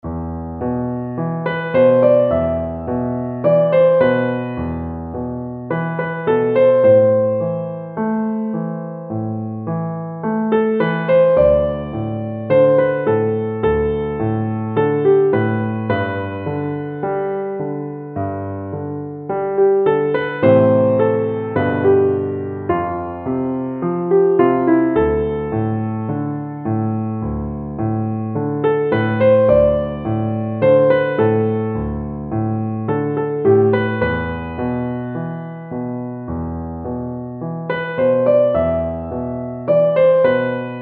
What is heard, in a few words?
piano